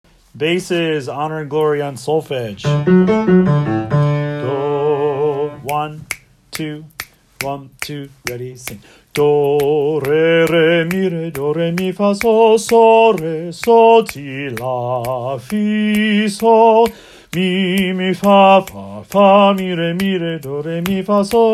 Bass Honor and Glory on Solfege; Wando Choir Class Placements

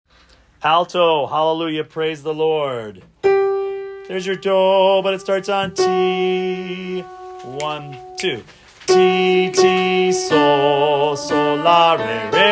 Hallelujah Alto solfege

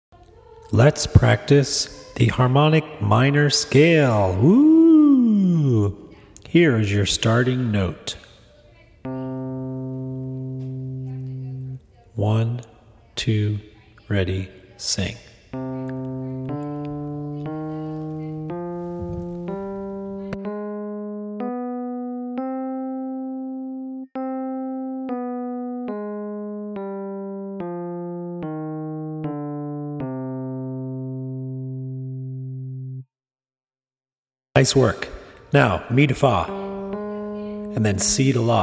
Harmonic Minor Scale Practice

